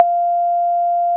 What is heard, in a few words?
analog conga, Yamaha TG-500